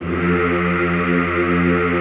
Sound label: Classical Choir, F1; Best Service; Extended Classical Choir